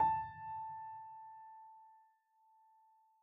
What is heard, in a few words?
piano sampler